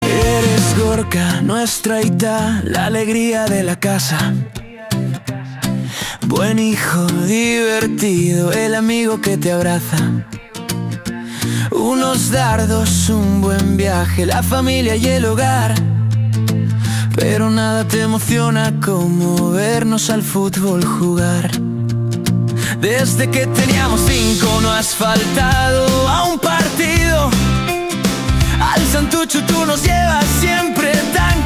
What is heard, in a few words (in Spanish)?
pop rock; dia del padre